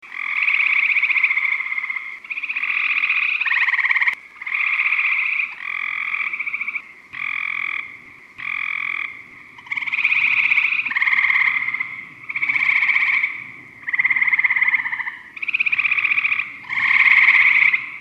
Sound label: copes gray treefrog, frog calls; amphibians; terrestrial